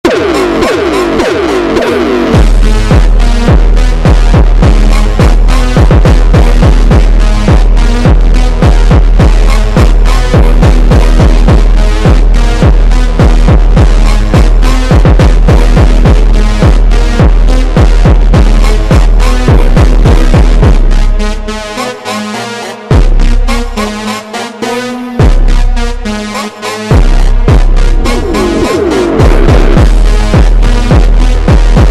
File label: slowed Reverb